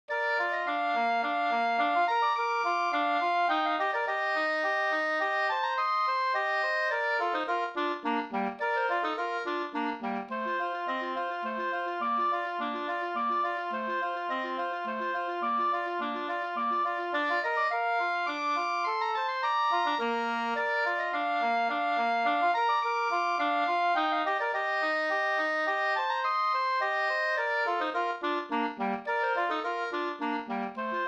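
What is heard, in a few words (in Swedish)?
slangpolska, two-part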